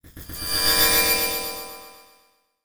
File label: Title Transition Delay Short